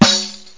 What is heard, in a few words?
riqq, arabic